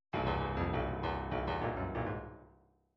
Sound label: midi fills rockfunk